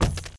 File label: Geo Rock Hit